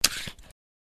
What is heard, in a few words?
Ejecting Magazine